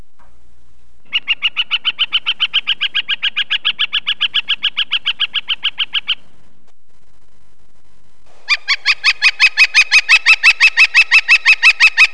Northern Flicker, bird songs of WA calls

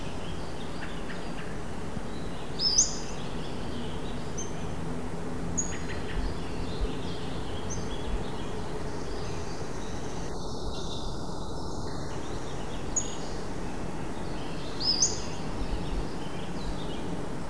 Pacific Slope Flycatcher, calls

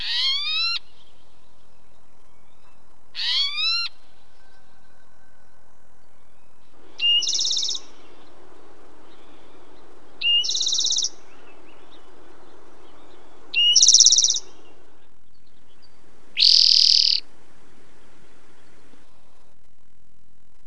Spotted Towhee, bird songs of WA calls

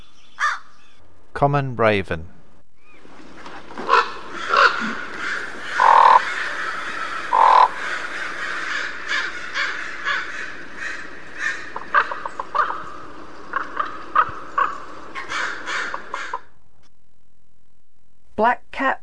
Raven, calls

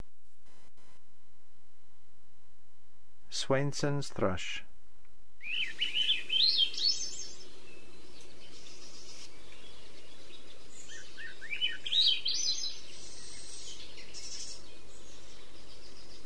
Swainson's thrush

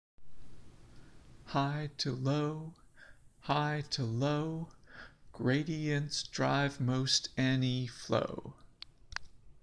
flow chant

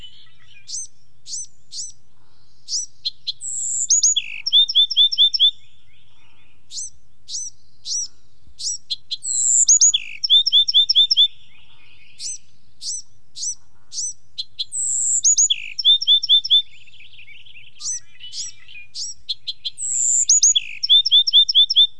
Bewick's wren CA

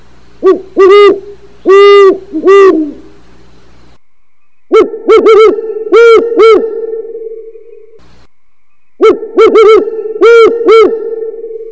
great horned owl, calls

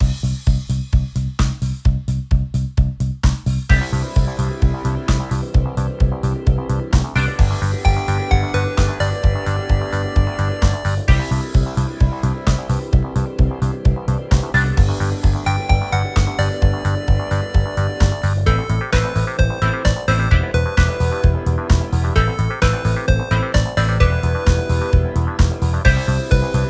karaoke

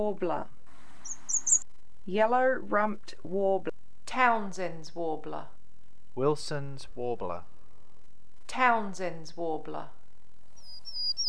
Townsend's warbler, calls

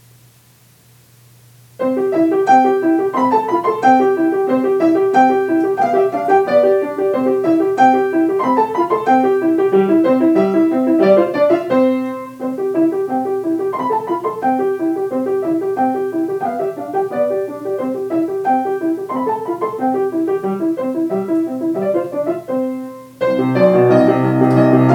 bagatelle piano; home

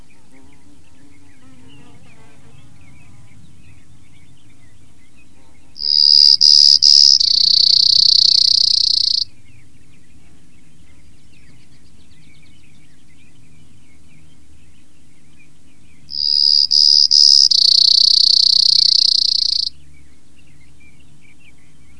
Brewers sparrow, bird songs of WA calls

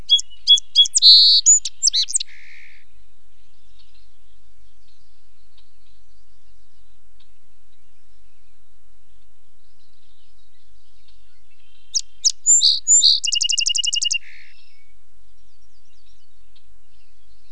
song sparrow, bird songs of WA calls

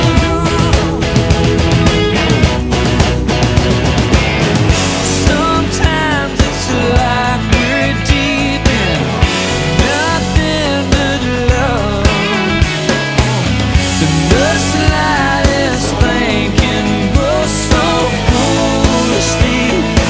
music

